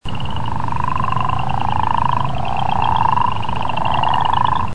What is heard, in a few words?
crawfish frog